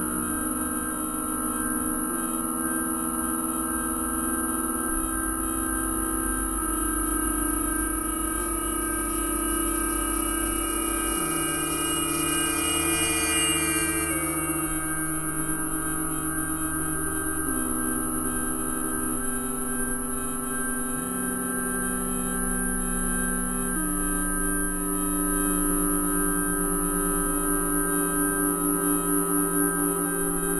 interspace

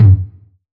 909 Tom amp; Goldbaby Tape808 & Tape909; The Tape 909

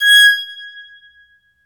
BOWED PSA, Bowed Psaltry

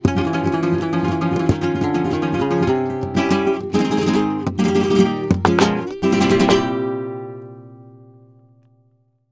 Flamenco, Guitar, Bulerias Y Solea, 197, c

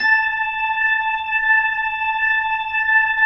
B-3 A 4, Jazz; Keyboards of The 60's and 70's

